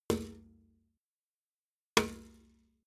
metal bucket hit bottom soft

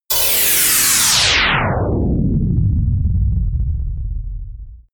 noise spin down drop short

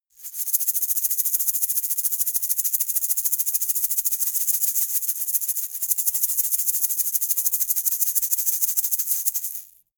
shaker plastic egg rattle hard

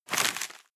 medieval page back

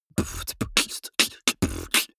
beatbox sequence open

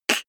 beatbox snare clap damped

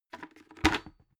bucket empty handling put down

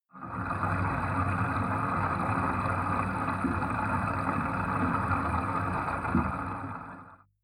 bathtub underwater fill

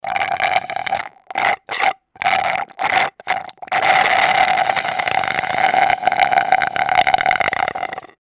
strohhalm slurping

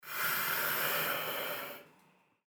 breathing

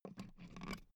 insert euro plug

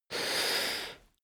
male breath medium in

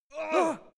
two scared men

